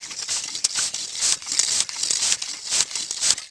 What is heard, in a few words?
pump; Dorling Kindersley My First Incredible Amazing Dictionary